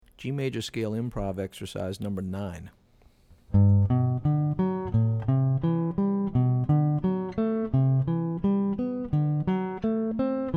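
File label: G Major Scale Improv Exercises; lead skills materials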